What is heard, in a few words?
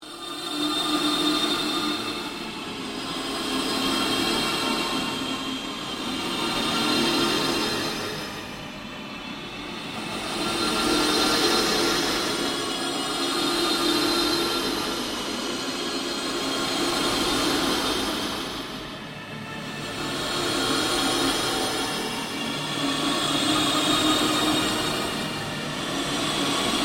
Ambient Drone Slow Sweep; Sound effects created by manipulating other sounds; Sound Design; SoundEffects-Foundation1